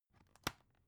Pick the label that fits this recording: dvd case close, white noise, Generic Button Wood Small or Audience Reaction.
dvd case close